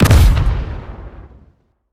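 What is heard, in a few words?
uav bomb, enemy sound impact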